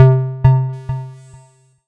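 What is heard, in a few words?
C1, Roland JV 2080, analog sequence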